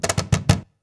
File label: CAJ FILL, cajon fill; Univers Sons - Raricussions